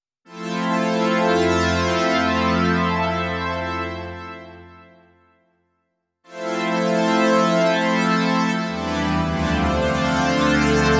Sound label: Nord G2 CS80